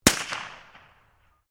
RIFLE CZ 452 VARMINT 22 CALIBRE FIRING

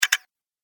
Sharp Clicks Fast Double Press; Andrew Fleming

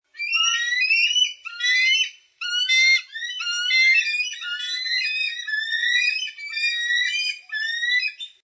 One Eagle And One Vulture Squawking Excitedly; Birds of prey: One eagle and one vulture squawking excitedly

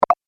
Game Unlock Chest, video game

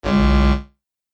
Game Fail Tone, Video Game Sound Effects

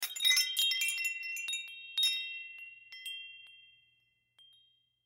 Wind Chimes Pick Up Ground Musical; Multimedia; Foley; Blastwave

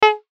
BEEP HIGH; Beeps (Sound Effects:Multimedia)

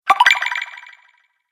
Fantasy Game Collect Sound, video game

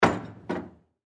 Ship Door Close Hard; Transportation Watercraft; Boat Sound Effects; Blastwave

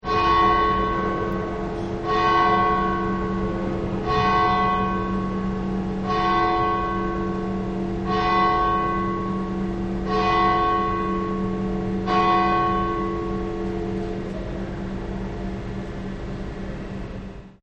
Church Bell Ringing; Bells; Musical

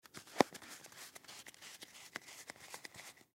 Cards Deal Fast, Cards Lay Out Half Circle Fasten; Casinos

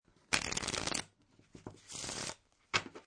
Card Shuffle, a fine card shuffle; Great audio of a fine card shuffle; Casinos

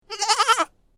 Goat Baby Goat Bleat, Young goat baah; Goat - Baby Goat Bleat 05; Goat Sound Effects

